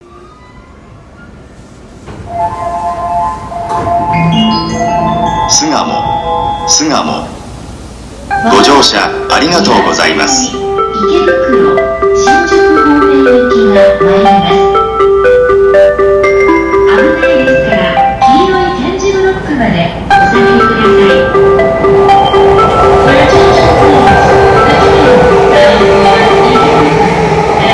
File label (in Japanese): せせらぎ; 巣鴨